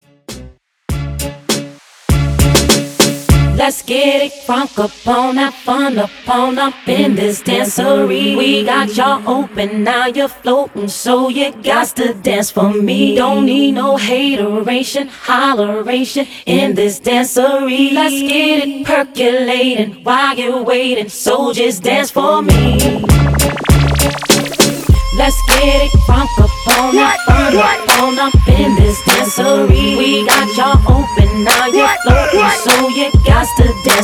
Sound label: Acapella in vs. Instrumental Party Breakz 100